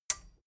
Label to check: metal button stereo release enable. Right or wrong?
Right